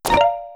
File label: correct positive